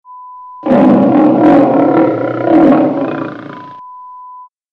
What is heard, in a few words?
Lion sound